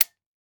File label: Weapon Gun Shotgun 12 Gauge Safety Off; AdobeCC